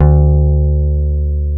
FEND P-BASS D2; Sonic Reality - Vintage Timetraveler

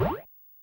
Chime; classic machines; talam

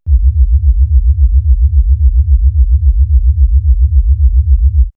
Even Lower, synth fx; StarWars; X-Static Goldmine

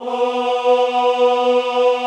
AHH B2, Classical Choir; Best Service; Extended Classical Choir

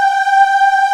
Aahh Chor, VOICE; Best Service Gigapack 1

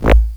blips, opl3

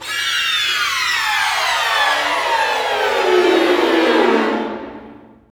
STR SEC, STRING GLISS; Roland - LA Composer vol. 1

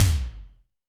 Tom Wet Hex, Alesis D4

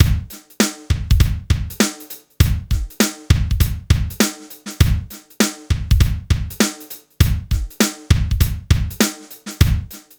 HIPHOP; INIS

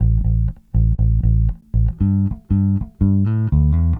Bass, 70s Analog Funk

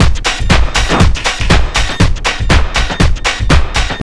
house loop

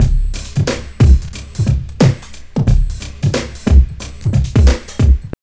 TRIP 90, trip loop

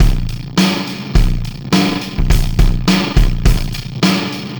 System Overload Beat, Processed Drumkits

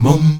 MUM D#3, Heart of Africa; Spectrasonics; STAC. MUMS

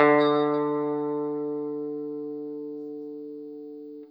Guitar & Bass; Quantum Leap; 90's Sample CDs

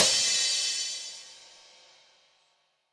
Crash, impact samples

